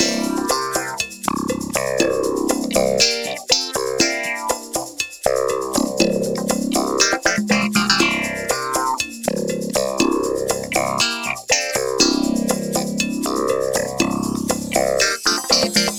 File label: CASUAL THEME 2 - LOOP 2; FREE CASUAL MUSIC PACK